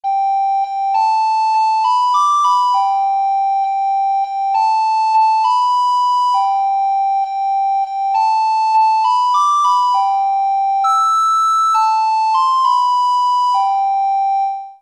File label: pop, recorder